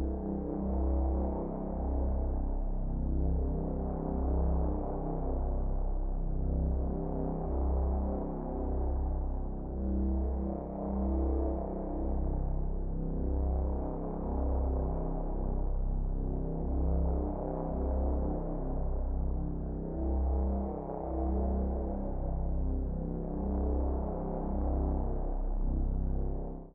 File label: Drone; Get Even